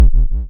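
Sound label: waveform FM triangle sine envelope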